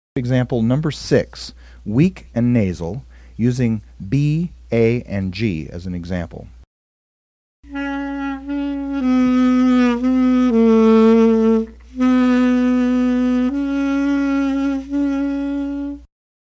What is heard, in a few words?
Emb SAX; saxophone